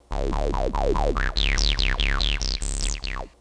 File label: Bass loop 144